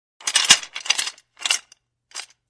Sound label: Shackles Metal Rattle; Hollywood Edge Foley Sound Library